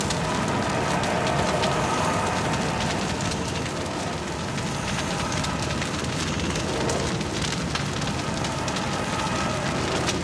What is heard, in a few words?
Sapling open; GrizzleMaw; Doodad